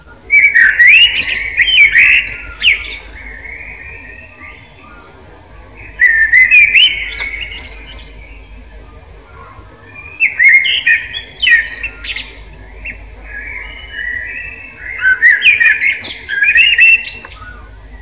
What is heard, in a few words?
blackbird, birds